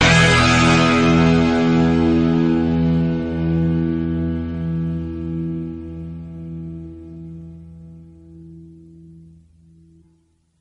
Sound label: glass, Guitar; Notes